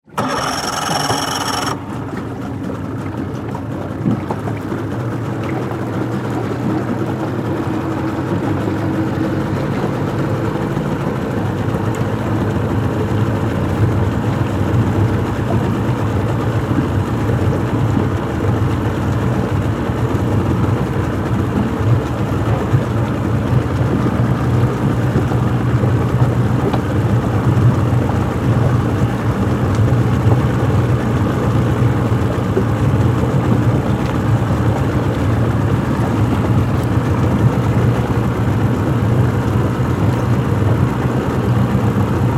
Dive Raft On Off, Transportation, Watercraft, Dive, Raft, Start, Up, Idle, Shut, Off; Boat Sound Effects; Blast Wave